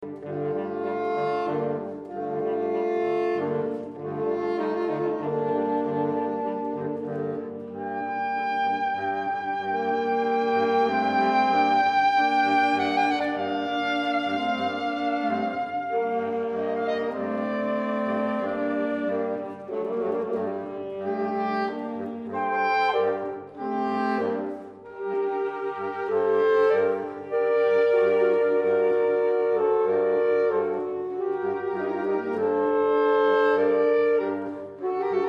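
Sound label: Soprano Sax Melody (with sax quartet accompaniment)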